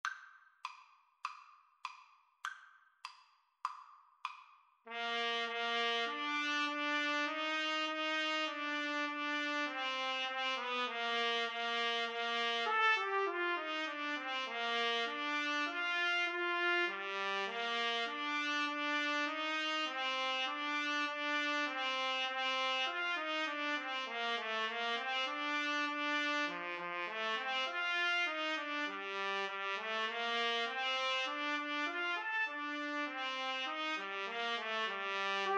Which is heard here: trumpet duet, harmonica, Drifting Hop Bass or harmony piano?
trumpet duet